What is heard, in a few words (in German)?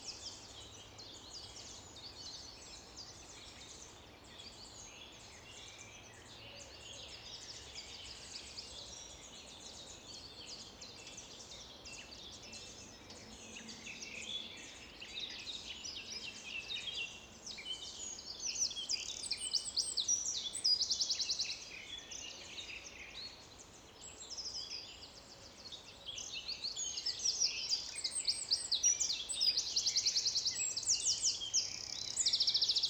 Natur